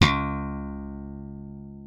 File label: C#2, slap thumb; Xsample VOL-1